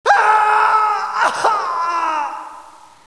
scream

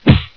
punch; portal house

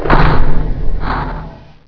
light switch

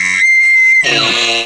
pit drone pain; betapitdrone

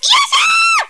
win

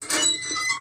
squeak door

